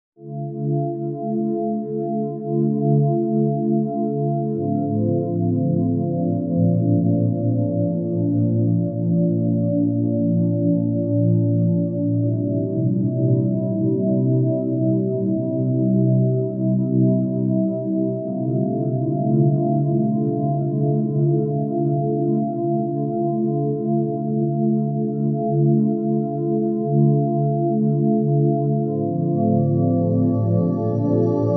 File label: Sleep Deep (432Hz Sonic Energy)